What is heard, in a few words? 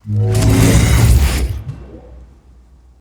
ability use